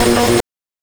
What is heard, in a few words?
BANK2 SFX, NES DPCM; MIDINES